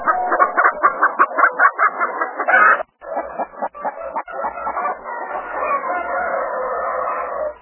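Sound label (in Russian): крик целого хозяйства куриц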